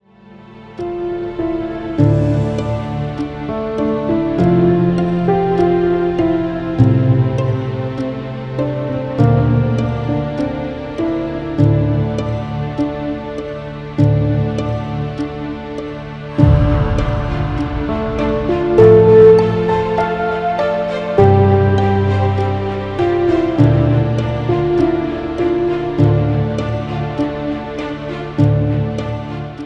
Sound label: Delicate piano & cello theme